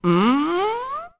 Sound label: Mmm; Happy Mask Salesman